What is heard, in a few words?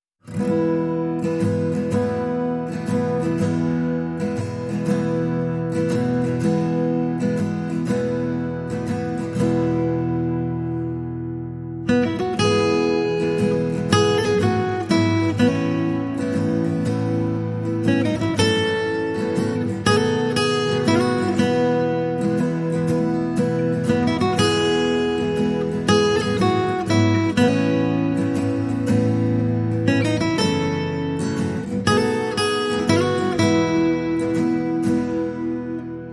On The Way Home, Guitar Only